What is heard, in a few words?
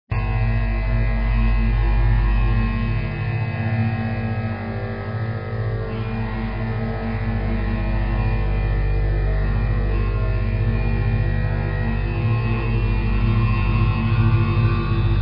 Windharp